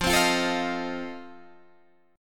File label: F 7th F down Guitar Standard; F7 strummed